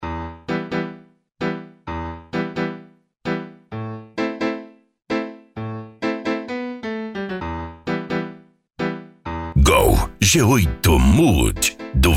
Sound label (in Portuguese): funk ritmado